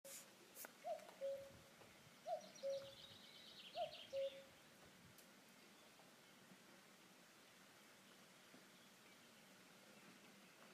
cuckoo

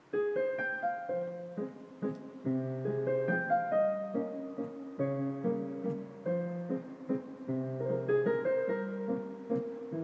smoky waltz

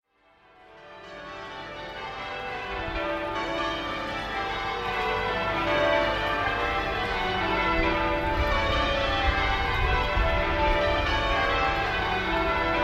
bells at Westminster Abbey; veday70

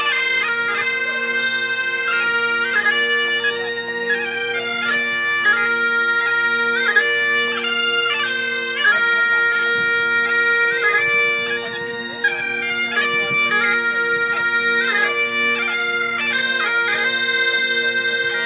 rehearsals for topping out bagpipes

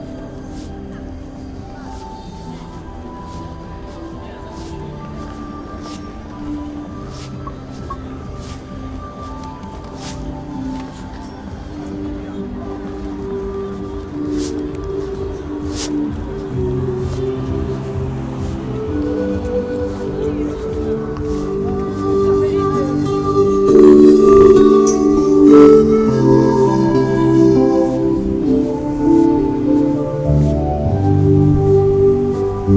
pipes of oxford street

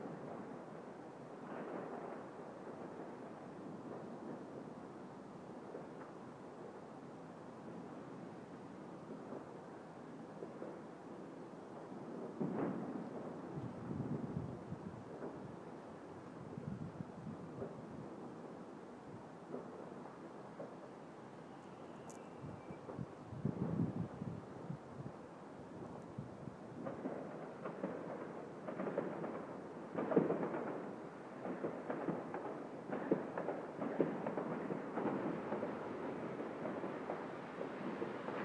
60 seconds of distant fireworks ambience; holbeck leeds

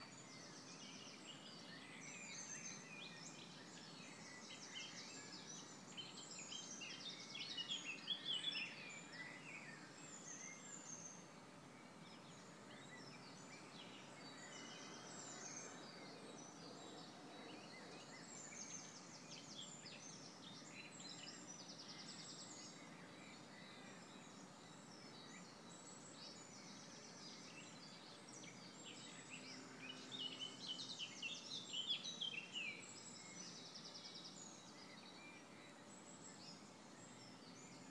tweeting

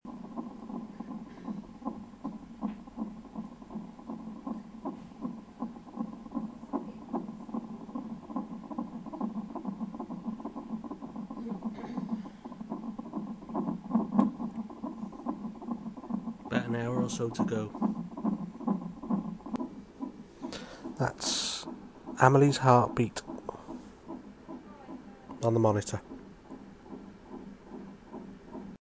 heartbeat

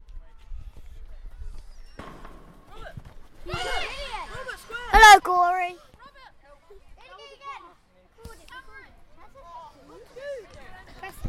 football

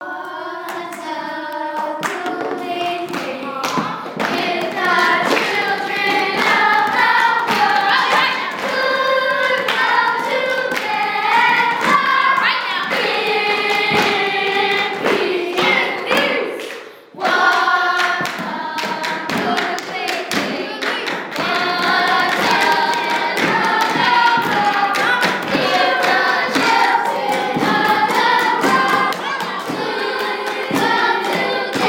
hip hop peace round

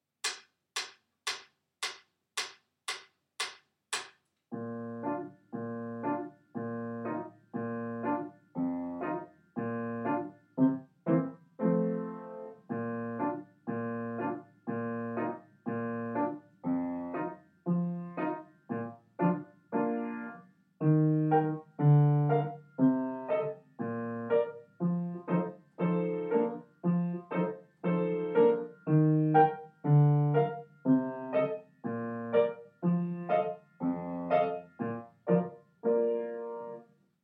Riding on a Donkey Piano Part

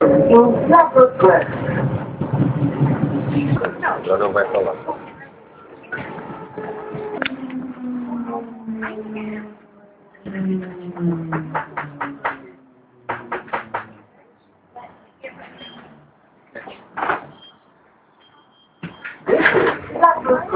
london tube

